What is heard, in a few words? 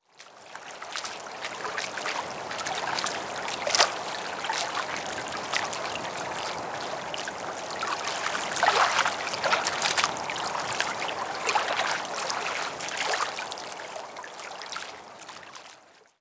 environment water quiet docks